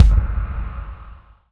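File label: bass drum, right; Zero-G - Chemical Beats; BASSDRUM ZOO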